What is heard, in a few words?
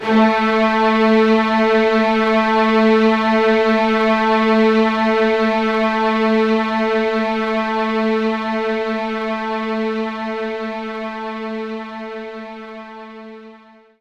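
String Loop A2; knights try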